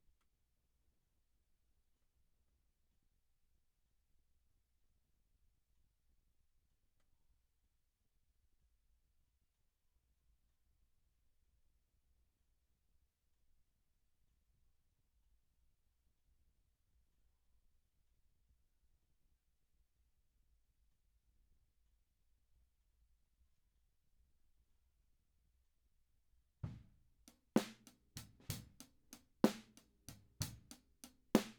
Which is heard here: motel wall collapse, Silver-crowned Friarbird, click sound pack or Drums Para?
Drums Para